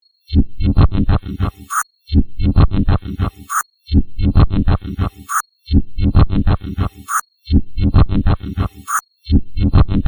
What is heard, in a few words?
Telemetry Blips, Scifi, Telemetry, Blips, Science, Fiction; Alien, Signal; Communication; BlastWave